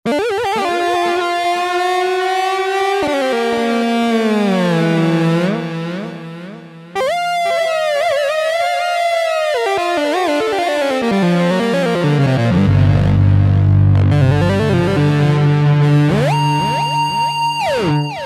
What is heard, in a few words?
Mono GT Lead, Roland V-Synth; Factory Patches